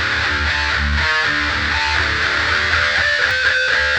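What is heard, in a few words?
Guitar (metal)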